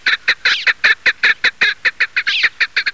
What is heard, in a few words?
Alectoris chukar; pticy